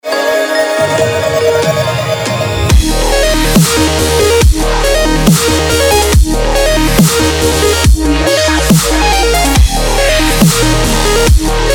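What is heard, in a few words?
melodic dubstep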